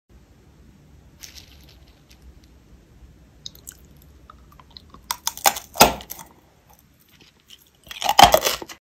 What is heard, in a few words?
Candy, خوردن آبنبات